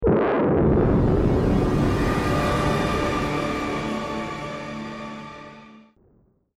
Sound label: deathcam; halflife alyx